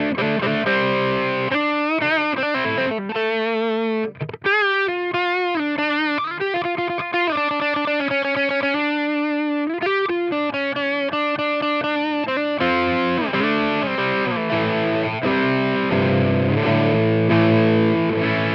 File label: Amplitube X-Drive Tubescreamer